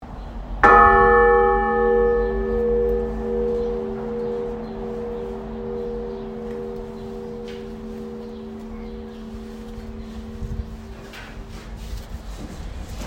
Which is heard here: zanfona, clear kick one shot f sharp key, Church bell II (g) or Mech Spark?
Church bell II (g)